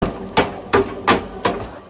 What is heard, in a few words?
hands slapping inside a washing machine